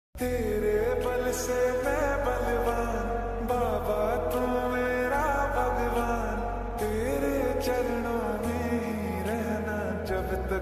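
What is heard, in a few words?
mere baba mahadev lofi ringtone slowed reverb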